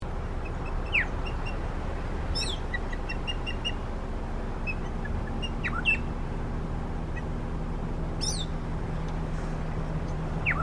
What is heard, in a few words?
osprey